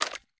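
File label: gear rattle weapon large; viper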